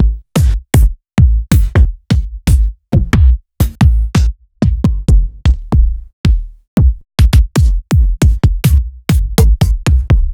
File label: Bassdrums; Sliced